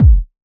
OSH Kick; Big Fish Audio; Old Skool House; OSH Kits; OSH Kicks

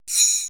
PERC MIX; Best Service ProSamples vol.55 - Retro Sampler; AKAI

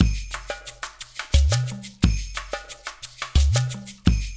loop, percussion; InVision Interactive; Percussion Slam; RULE WORLD

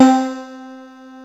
piano voice, Kawai; Rubber Chicken Software Sample Bank

